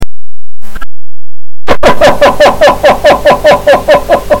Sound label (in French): rire